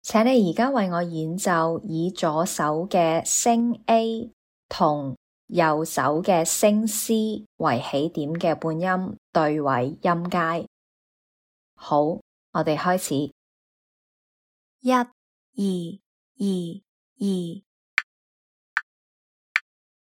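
chromatic contrary motion scale starting A sharp left hand C sharp right hand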